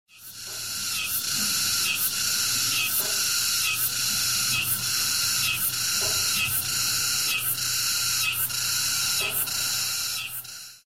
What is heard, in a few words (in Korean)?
숲 매미